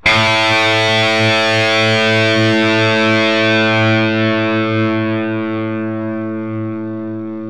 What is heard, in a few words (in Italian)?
distorta